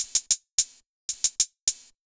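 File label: 110 hi hat loop, Ragga & Dub Reggae; USB Soundscan vol.27; AKAI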